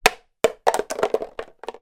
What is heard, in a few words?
household small plastic empty water spray bottle drop concrete